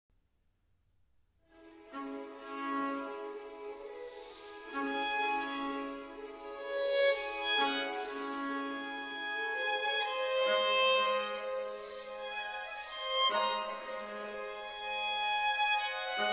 Concerto in E Major La primavera Largo e pianissimo